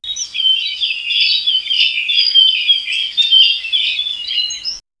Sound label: early morning birds singi